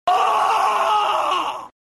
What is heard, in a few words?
flapjack scream; discord